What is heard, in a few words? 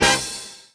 Tennis Hit; Party Crasher - Combo